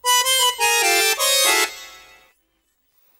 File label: Noisy Accordian; pingu the original soundtrack